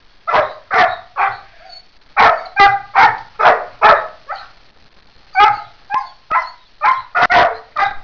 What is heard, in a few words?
dog bark